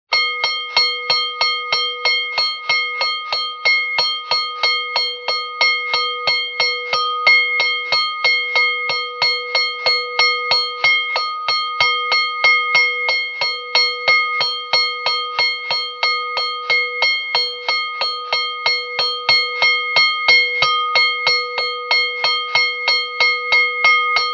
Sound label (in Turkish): tren sesleri uyari sesi; tren duduk sesleri